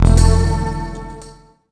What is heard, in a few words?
objectives complete